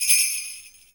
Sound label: shaker, percussion